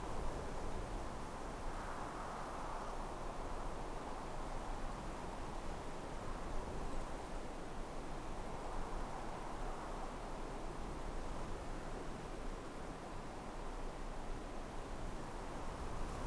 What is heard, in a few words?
open wind ambience; theed; Star Wars Obi-Wan; XBOX